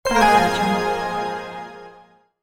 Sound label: descending short synth arpeggio accent; sound ideas